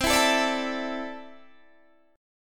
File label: C 6th Add 9th C down Guitar Standard; strummed; JGuitar